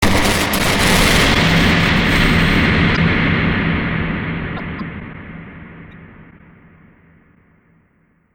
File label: dramatic; slender; arrival